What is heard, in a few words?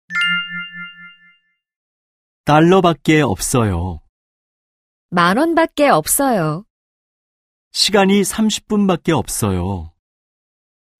K-POP